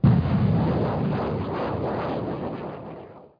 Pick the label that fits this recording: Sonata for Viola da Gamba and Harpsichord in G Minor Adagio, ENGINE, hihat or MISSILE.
MISSILE